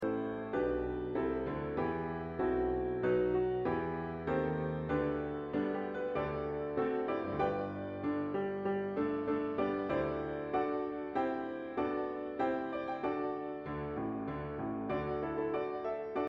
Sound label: Piano